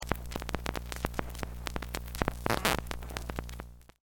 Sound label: ANALOG DIRT